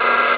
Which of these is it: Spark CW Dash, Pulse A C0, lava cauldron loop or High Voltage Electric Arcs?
Spark CW Dash